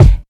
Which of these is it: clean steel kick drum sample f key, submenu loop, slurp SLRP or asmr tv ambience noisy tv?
clean steel kick drum sample f key